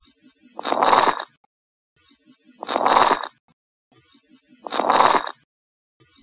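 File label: Coarse, Crackles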